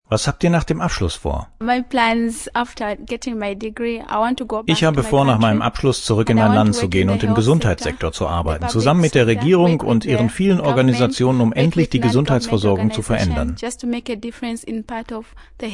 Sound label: university choir; simbabwe